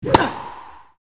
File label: Strong tennis serve male player grunt